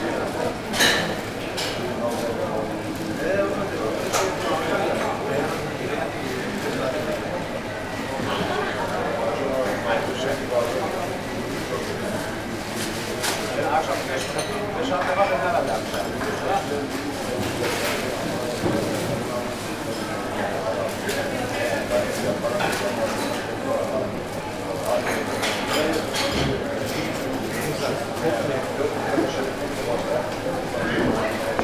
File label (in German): einkaufzentrum; roland r-26 testbericht; omni